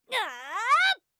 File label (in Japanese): 攻撃